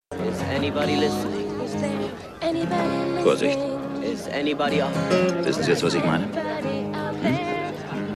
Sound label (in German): Saengerin